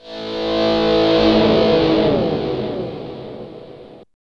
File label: GTR CHUCK, E.Guitar FX